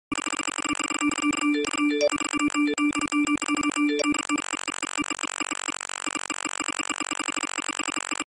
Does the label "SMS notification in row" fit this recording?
Yes